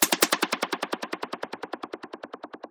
Chopped, Synth FX; musicradar; cartoon caper

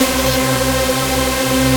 Synths Root C

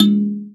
KALIMBA; Spectrasonics; Heart of Africa; KALIMBAS

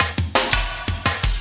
loop, Drum & Bass, 170 BPM

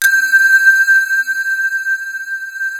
ICE SYNTH G5; Greytsounds VOL-1; VFX

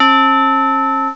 DORCHIME; BELLS; M8; CMI Factory

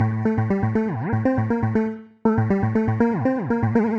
Mod Mix A, 120 bpm, A, modular; modular madness

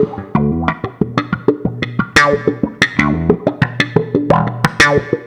Bass, Promotional Loops; ESSENTIAL SOUNDS III; Sonic Foundry (Sony Creative Software); Not Just Another Pretty Bass

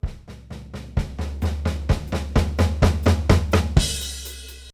Fills 64; Zero-G; Monster Beats

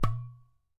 GHATAM, DRUMS OF IND; AKAI S6000 CD-ROM; WorldSounds

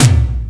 BIG tom, Emu Sp12

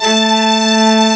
BIGSTRN, LOSTRING; CMI Sound Library Rev 1.3

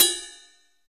Dr. Rhythm 550, digital; Sound & Vision - Gigapack I

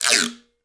GUIRO; ILIO; Synclavier Percussion 2; World & Orchestral

